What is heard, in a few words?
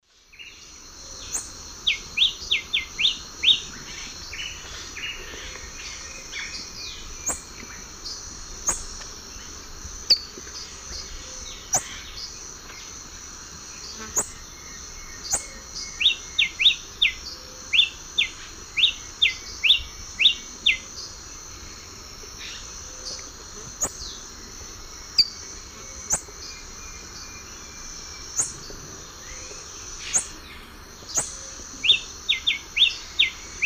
Ramphocelus nigrogularis, song and calls; S. America; Eastern Lowlands